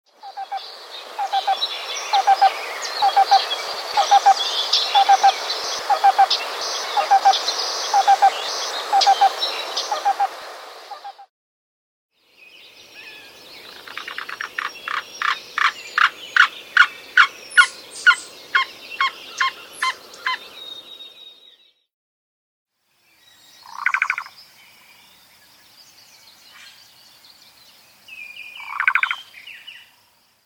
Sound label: Black billed Cuckoo